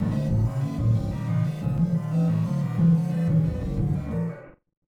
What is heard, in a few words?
Malfunction Synth, malfunctioning synth samples